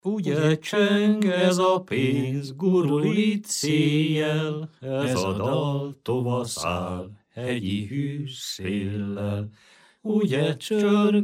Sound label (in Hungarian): nepdal; ugy e csengez a penz; nepdalok